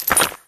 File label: button; block super match